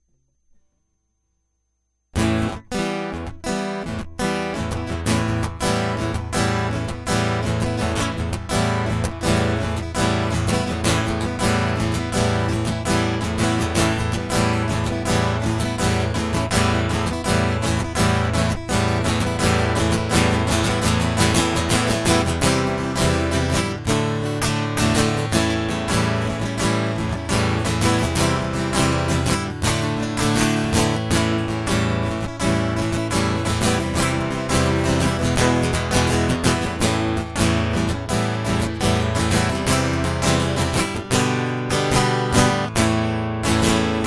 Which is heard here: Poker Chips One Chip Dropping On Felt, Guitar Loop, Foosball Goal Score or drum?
Guitar Loop